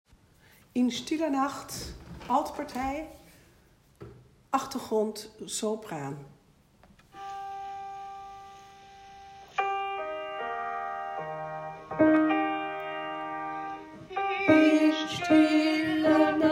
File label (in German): in stiller nacht alt